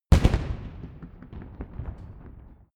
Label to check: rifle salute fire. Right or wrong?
Right